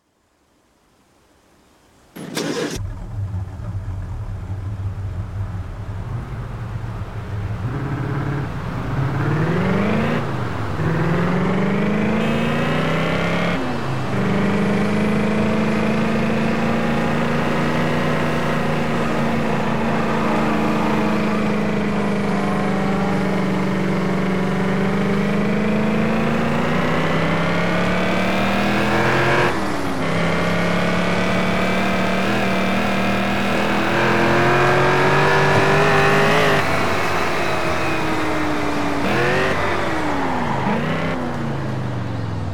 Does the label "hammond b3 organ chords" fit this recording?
No